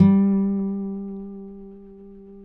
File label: Finger G 3 R, Guitars; Spectrasonics; Hans Zimmer Guitars VOL-1